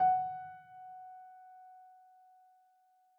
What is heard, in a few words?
piano sampler